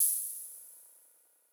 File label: CYMBAL, Sound-Master SM-8